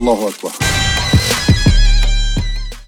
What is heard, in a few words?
allahu trap bar